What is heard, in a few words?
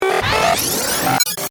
Power Up Digital, Sweeper, Blip, Ascend; Radio, Stinger, Production, Element, Imaging, Accent, Transition; Tech